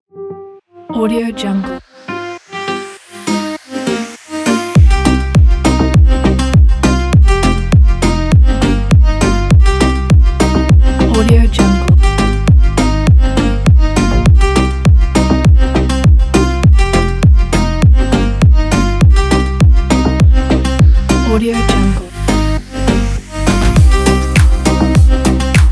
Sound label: guitar summer house beat